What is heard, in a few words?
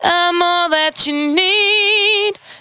92bpm Bb, Velvet Vocal; Zero-G